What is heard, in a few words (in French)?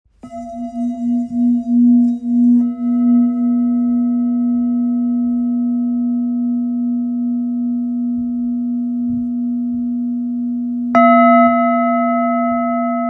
Bol ancien la; Bol chantant ancien de l'Orissa 530g